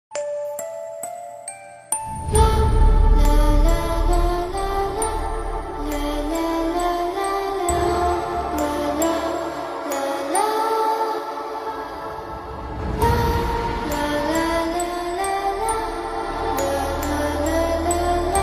movie ringtone; munjya movie

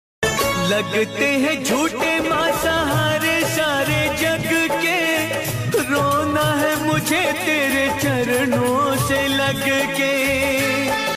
bhajan bhakti song, Navratri special song

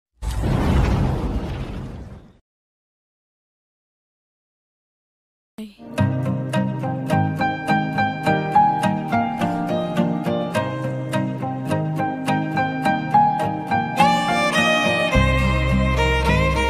love bgm telugu; Female Flatmate; web series; Seema Tapakai

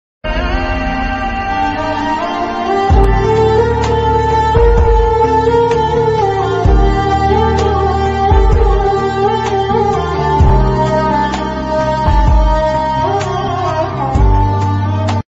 instrumental ringtone sad bgm ringtone; yaariyan movie

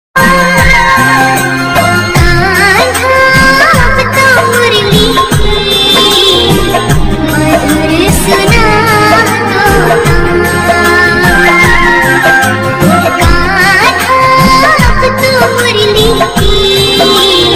krishna bhajan ringtone; krishna radha; bhakti ringtone

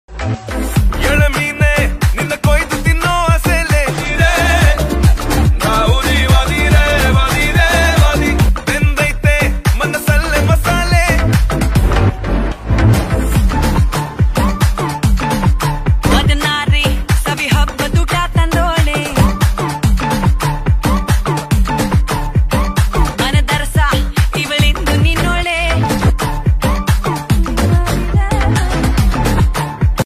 telugu song ringtone love ringtone; devara telugu movie; ntr

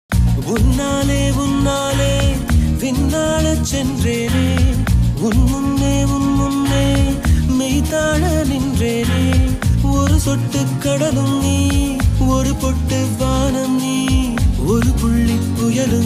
tamil song

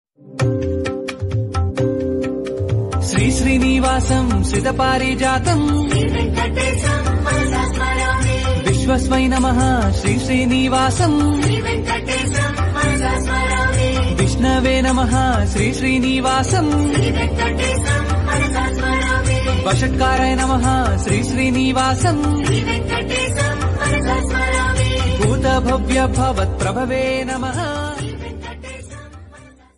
devotional tamil song ringtone; Tirupati Balaji

